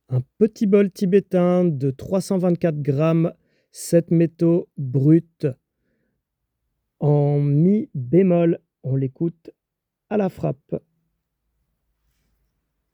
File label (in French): Petit Bol Tibetain Martele 7 Metaux Aspect Brut Note Mi Bemol